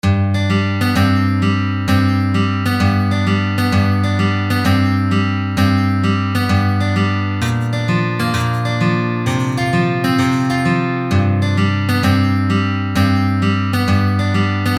guitare

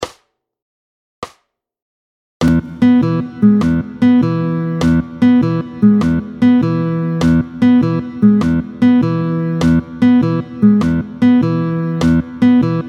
ternaire 100, arpeges aux doigts

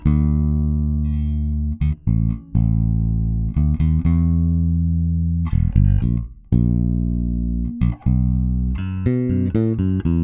Basse

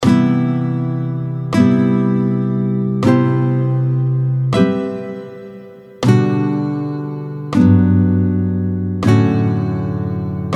tempo 40 accords usuels 7M, Accords de 7ème majeure, tempo 40, guitare

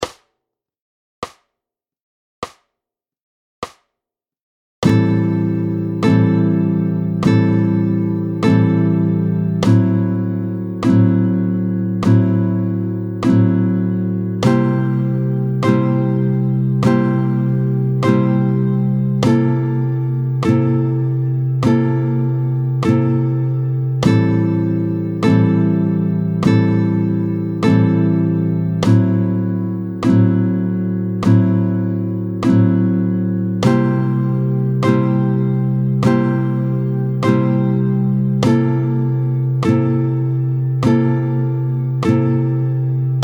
Accords gypsy tempo 50; 16-05 Les accords « Gypsy », tempo 50

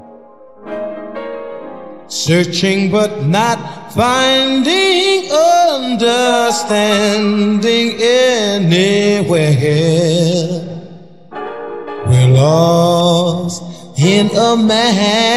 couplet voix piano, La voix sur le thème (avec piano)

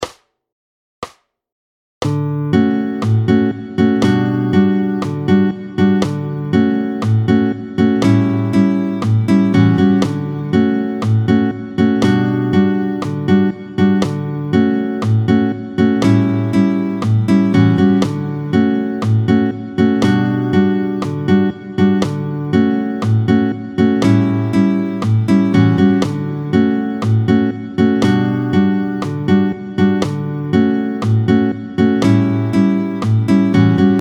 Toute petite bossa Vite 2 2 tempo 120; 16-04 Toute petite bossa. Vite 2/2 tempo 120; Exercice chapitre 16 page 69; Do7M, Fa7M, Sol13

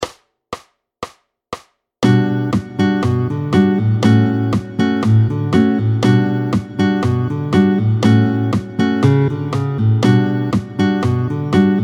Vite tempo 120

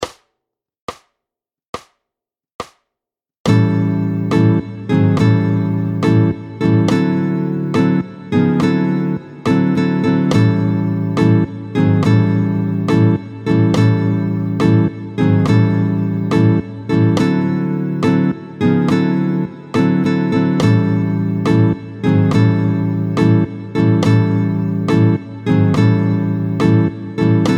Petit blues binaire; rythmes binaires ternaires

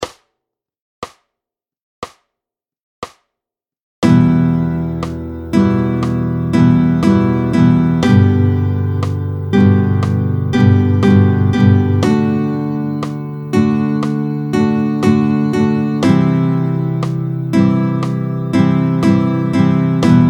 rythmique tempo 60

